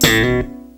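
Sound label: forwards BASS; E-MU Formula 4000 Series Vol. 1; Hip Hop Nation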